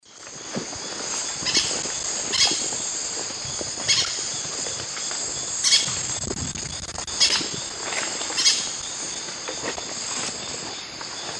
Pyrrhura melanura, contact and flight calls; S. America; Eastern Lowlands

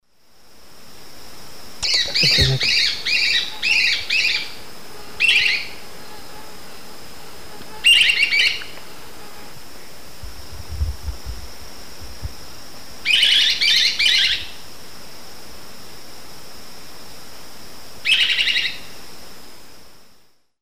Conopias parvus, calls; S. America; Eastern Lowlands

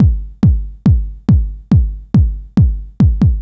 ACID TECHNO

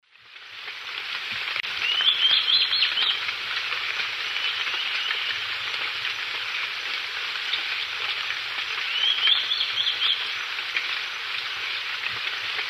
Gymnopithys leucaspis, subsong; S. America; Eastern Lowlands

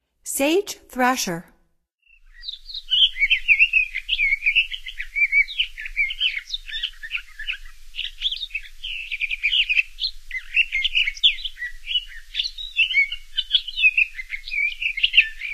Sage Thrasher, Songs of Birds of North America; Stokes

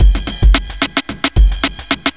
block rock kit

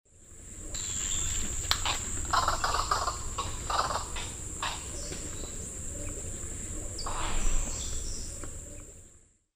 Crotophaga major, flight calls; S. America; Eastern Lowlands

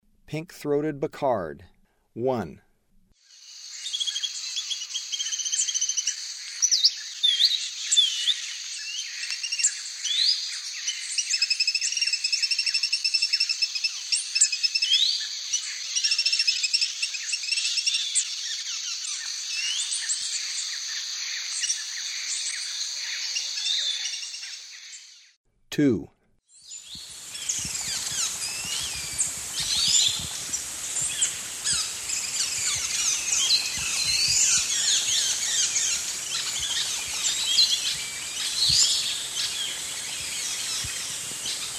Pink-throated Becard; S. America; Eastern Lowlands

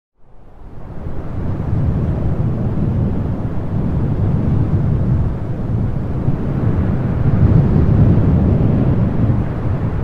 Wind Sounds Soundscape; Full 60 Minute

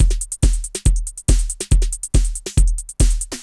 techno 140

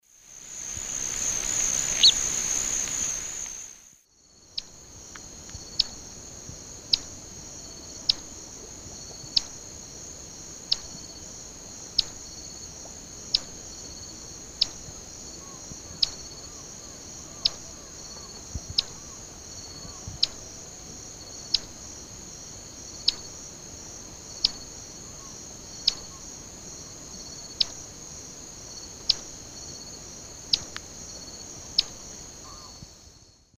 Hydropsalis climacocerca, 2 call types; S. America; Eastern Lowlands

